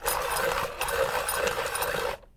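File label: PEN SHARPENER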